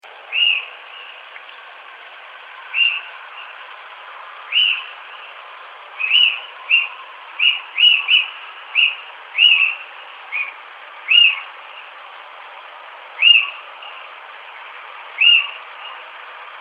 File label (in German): Steinkauz Ruf